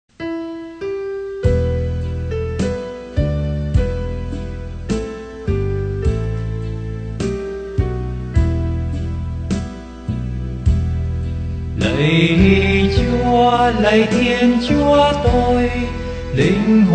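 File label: soprano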